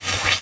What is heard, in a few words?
rift Door; DimDoors